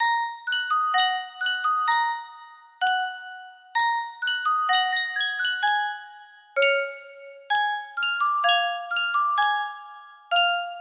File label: Yunsheng custom tune music box march